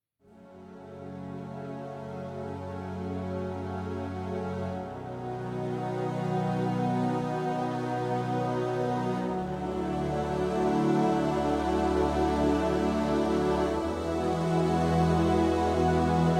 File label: Jazz Version